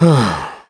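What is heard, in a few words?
Vox Sigh, korean; voices; heroes; KaselB; kingsraid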